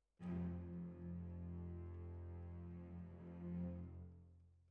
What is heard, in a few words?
Violins, jazz for piano